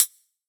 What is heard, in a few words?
Terror Closed Hi Hat, Dubstep; Drums; One Shots; Cymbals